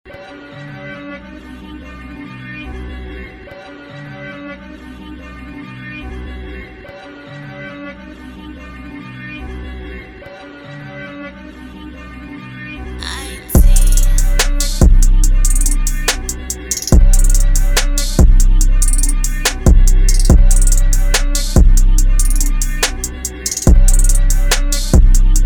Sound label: Instrumental